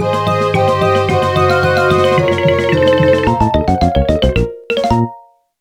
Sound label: music jingle bonus lose; Donkey Kong Country Returns HD